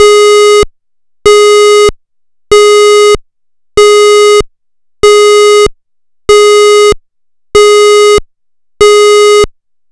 Interrupted Australia, Australia AS2220, AS1610, AS1670; Interrupted tone; pfannenberg; signaling-technology; combined-signaling